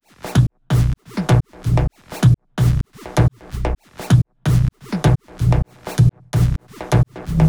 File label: 128BPM Melody; Vengeance Samples; Jamboo Kit